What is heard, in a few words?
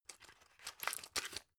drug pull out; arzt